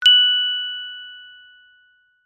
multimedia notification bell chime ping tone high pitched